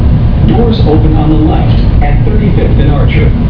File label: doors; Archer